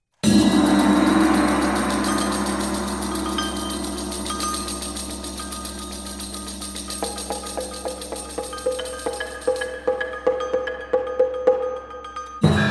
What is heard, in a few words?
Six Japanese Gardens for percussion and electronics